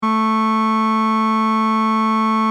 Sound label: mono mix, acoustic